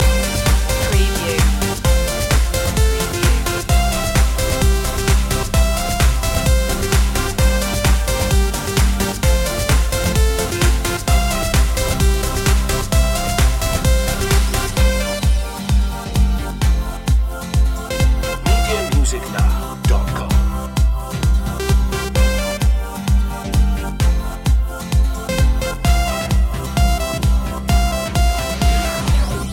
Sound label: fresh and funky loop